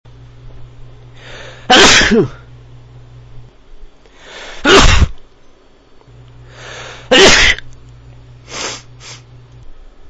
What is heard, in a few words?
maybe new allergies, male